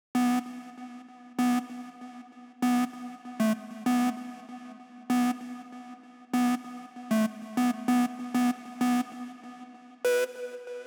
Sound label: Square 97 Bmin